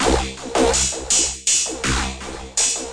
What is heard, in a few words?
082 slobbering bell beast